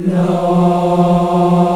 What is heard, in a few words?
VOX, African Aahs, African Las